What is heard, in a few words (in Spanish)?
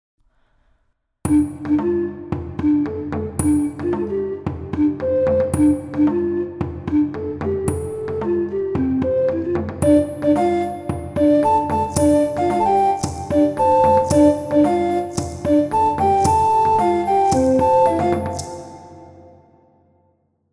la ocarina; mesoamerica